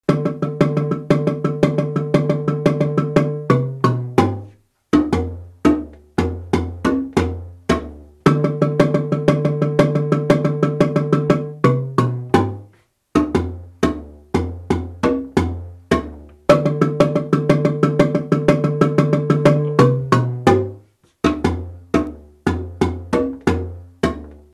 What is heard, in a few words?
bass response, marimba; kare mugomba